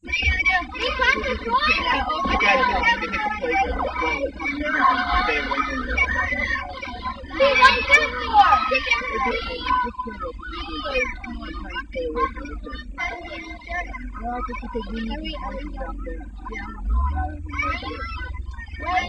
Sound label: kids playing